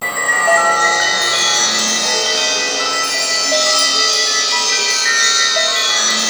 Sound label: A#3 WINDY; Northstar; The Wizard VOL-1; BELLTASY